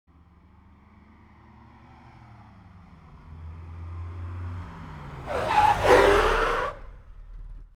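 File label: sharp car braking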